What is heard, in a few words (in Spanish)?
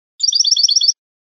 cascabeleo fino; campero